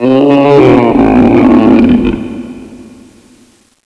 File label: heavy death, zombie; Zombie Darkness